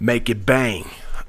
Rap Vox

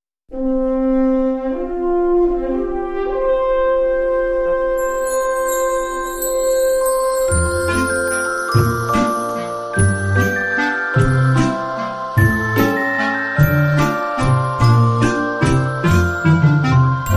Jingle Bell Commercial

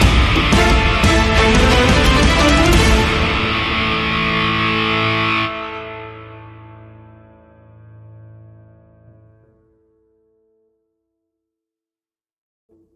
Gothic Impact Stinger; bbc

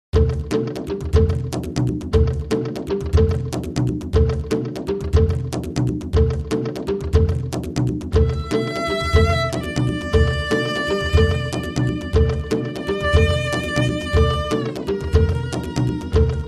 ceremony

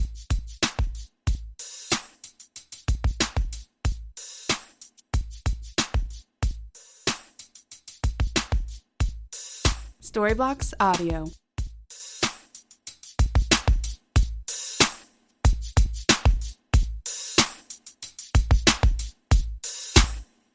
85 c min multi tap clavinova

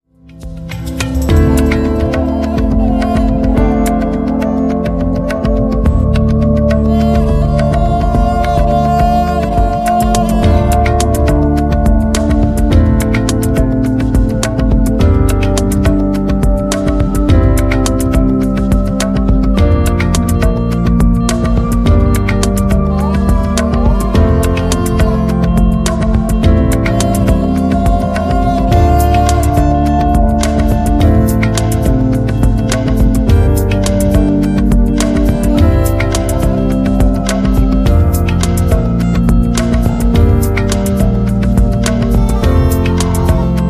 ethnic pop

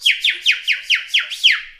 Rap Vox

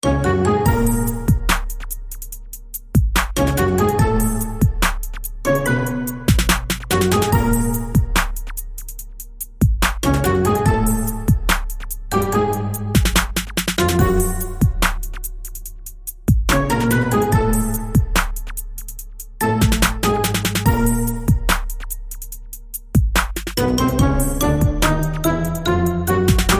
cartoon cool alt mix

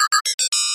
robot bleeps rhythmic

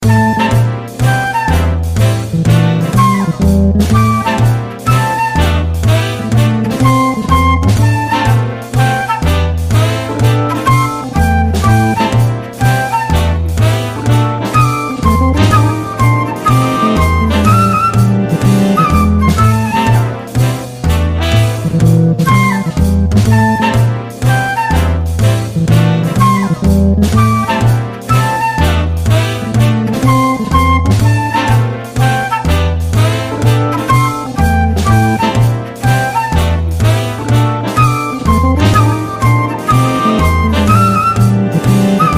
swing days

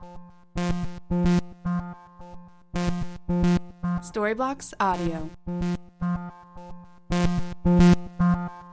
Ambient House Arp 110 BPM C

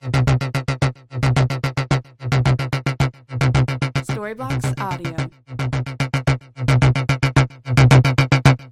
Ambient House Bass Arp 110BPM C

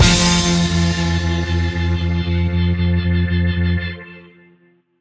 pop rock from the sixties stinger; bbc

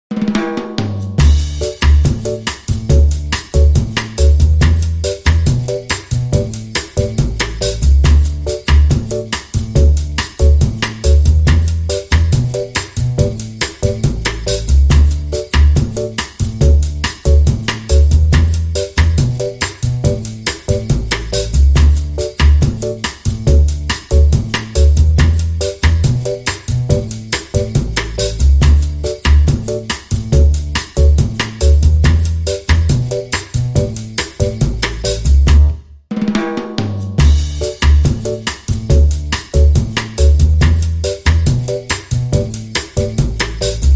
tiki time drums bass epiano

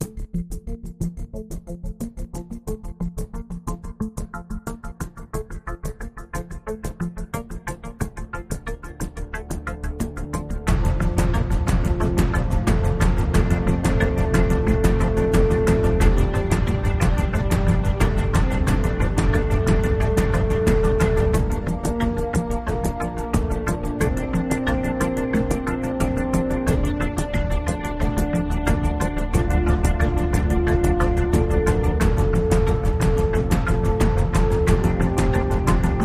Alt Percussion

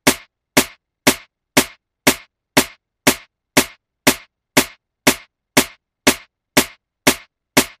Ambient House Snare 120BPM

